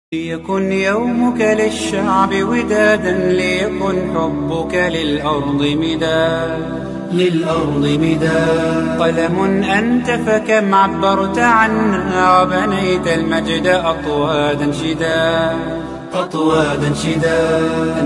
arabic nasheed; beautiful arabic nasheed

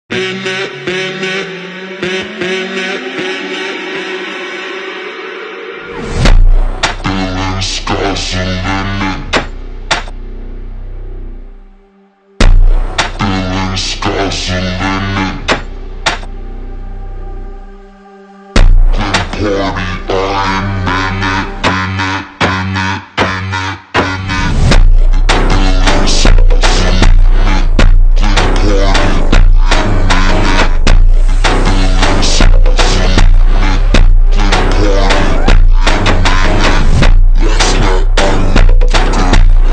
brazilian phonk ultra slowed reverb

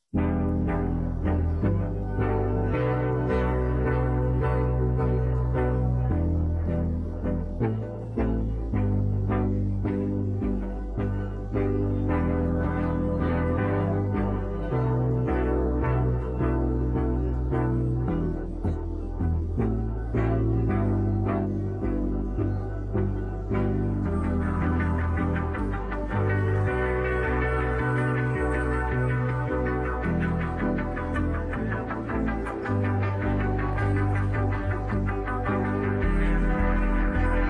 instrumental

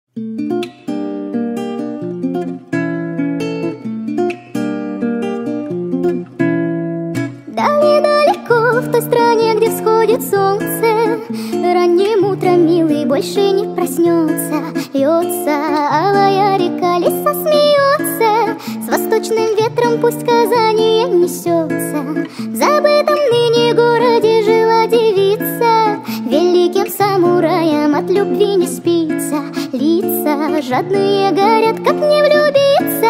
acoustic sped up

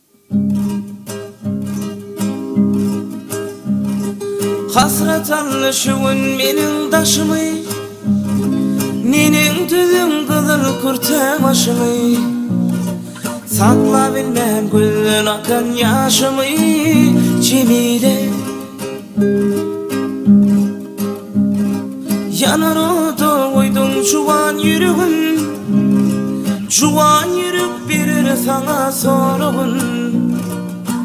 gitara

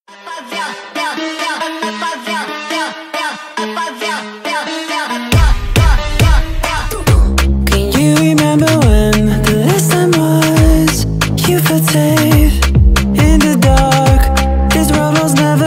nightcore male version

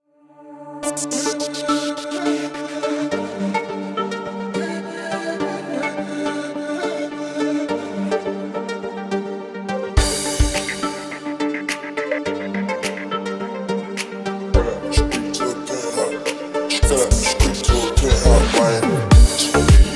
deep house remix

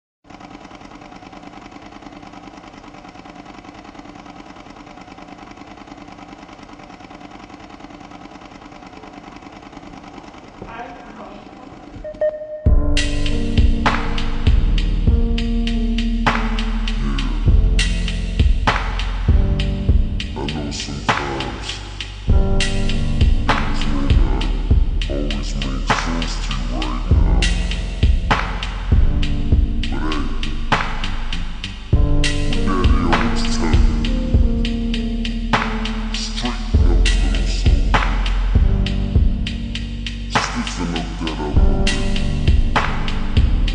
super ultra slowed and reverb

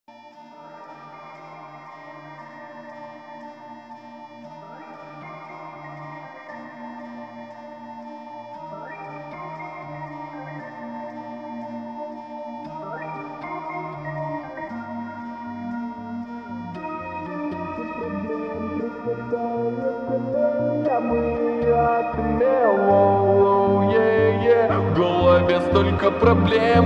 slowed and reverb

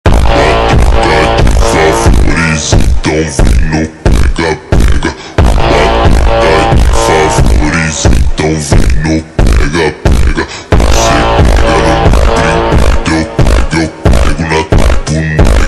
automotivo slowed reverb

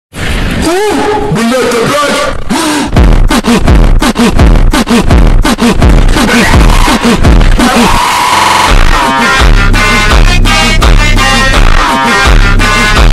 funk slowed

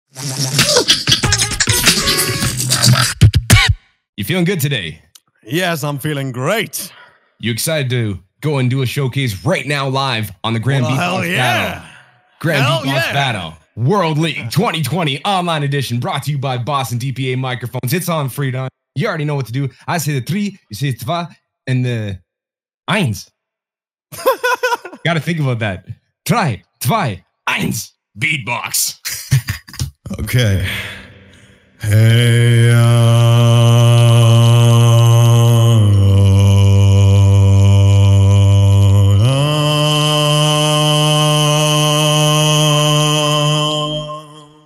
beatbox loopstation; Grand Beatbox Battle 2020 Online Loopstation; Elimination

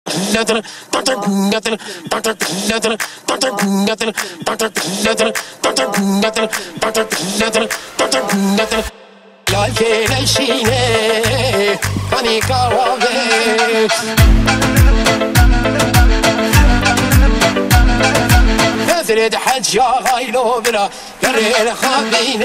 Kurdish live mix

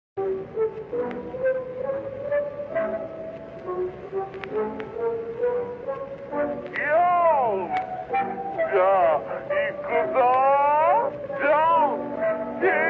super slowed bass boosted

